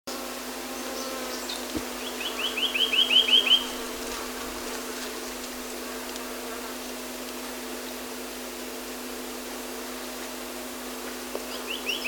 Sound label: reunion cuckooshrike